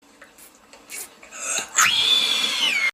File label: fish screaming